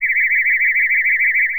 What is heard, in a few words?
sci fi; Masterbits - Switch FX